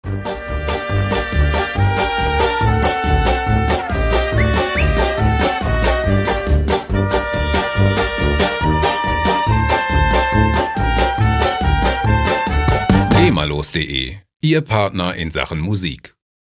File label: klezmer wedding